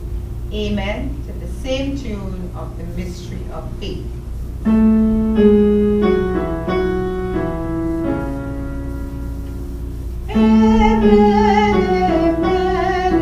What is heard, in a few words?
amen